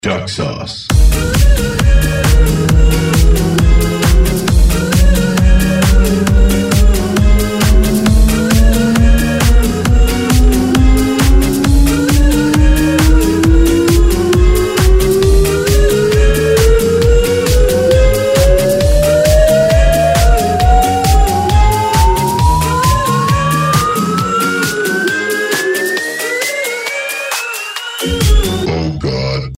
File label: Melody, RingTune